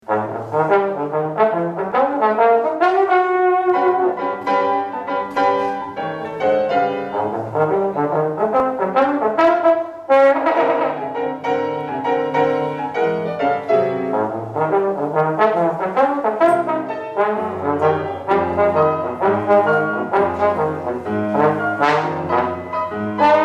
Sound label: Jazz for Trombone and Piano